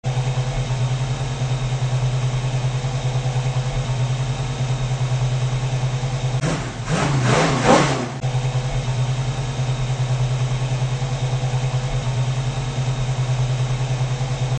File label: mustang; cars